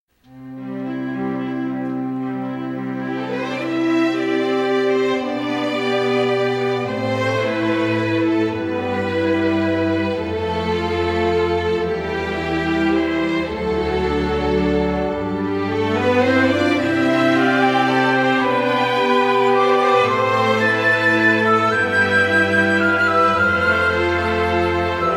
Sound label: holiday waltz